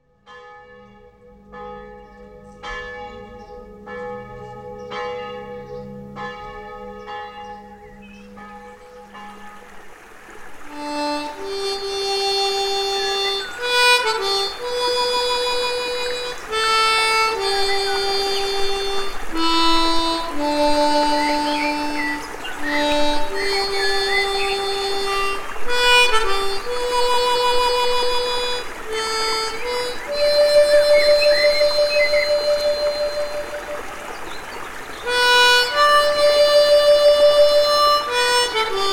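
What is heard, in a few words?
solo G, Mundharmonikas; Bestattungen Trauerfeiern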